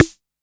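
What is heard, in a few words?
Analog MT40 Snare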